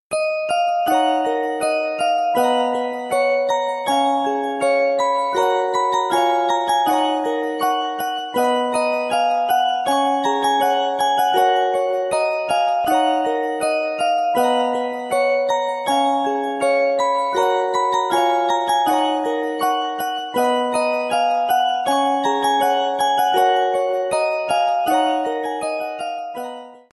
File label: Music box